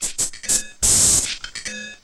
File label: CANNED AIR; Rarefaction; Poke in The Ear With A Sharp Stick